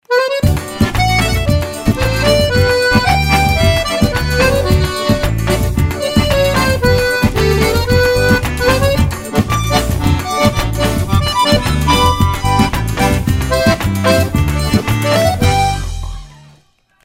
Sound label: Forro; LG; Antique Fair